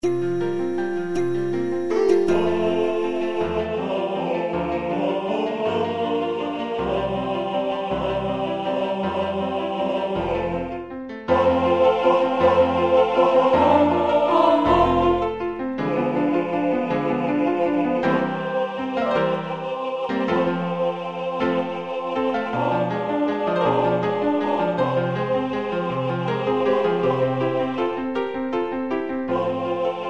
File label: laudate ten; Mozart; Laudate Dominum